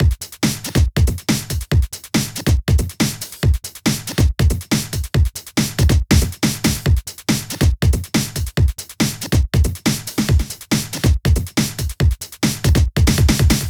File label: Loops BB 140, BreakBeats; Vengeance Samples